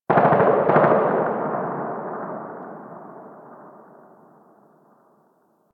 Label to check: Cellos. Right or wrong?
Wrong